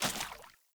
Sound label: Water Step; Characters; Ambient; Steps; Sprout Farmers